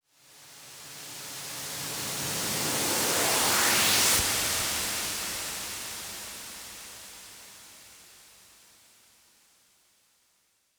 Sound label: Reverse FX 140BPM; Sound FX; Vengeance Samples; Selection Kit; E minor